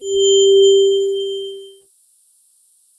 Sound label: Tone; GoogleVR; HelloVR